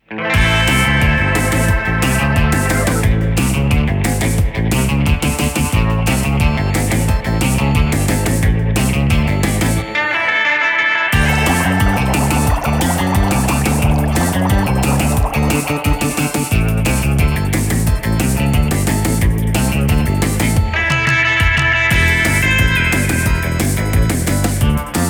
Level Abyss Loop; running gods

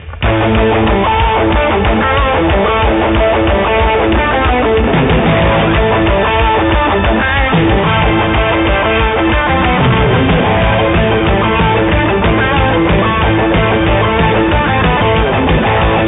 Stage Music; Meta Fox

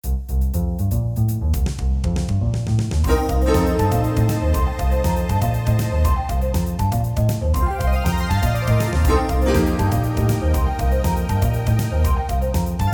Warning; dragon force

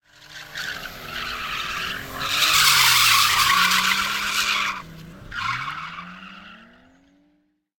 Car pass

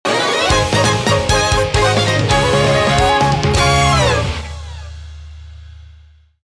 Victory - Unsettling Area; paper mario the thousand year door

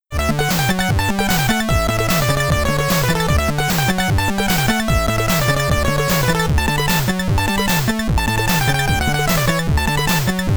Stage Clear (SID Stereo); qix commodore 64

